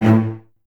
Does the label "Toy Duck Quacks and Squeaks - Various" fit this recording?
No